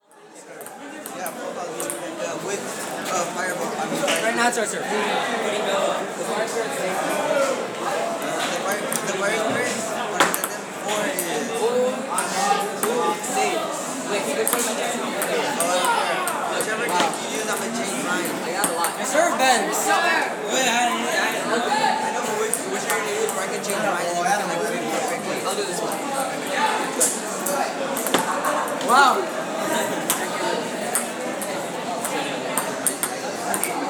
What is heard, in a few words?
High School Soundwalk; Loyola